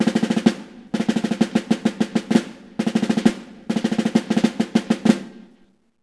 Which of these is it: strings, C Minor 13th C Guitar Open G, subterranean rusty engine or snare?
snare